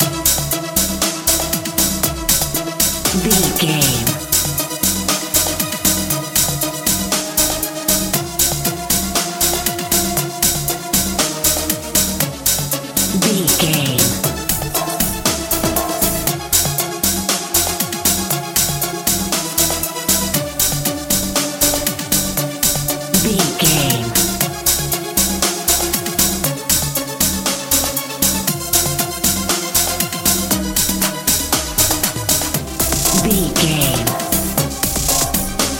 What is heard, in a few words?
fun warm rice grains life modern dance